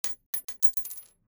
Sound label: Gun Shell Drop; soundscrate